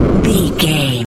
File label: retro explosion bass